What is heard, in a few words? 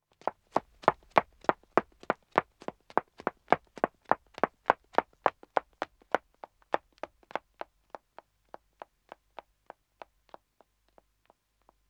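Wood (Dead Acoustic) Running 1 woman, Footsteps; BBC Sound Effects Library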